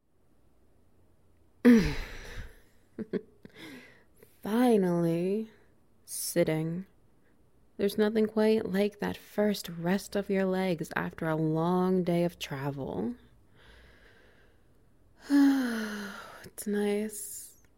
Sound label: SFX Wet Noises